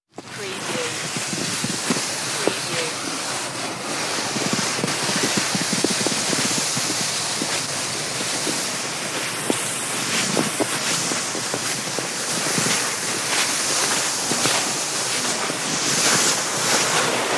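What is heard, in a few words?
Drag Sled Snow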